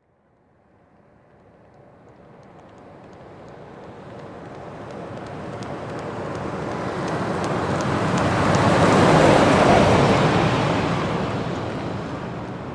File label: Expedition Slow By Ti, ford expedition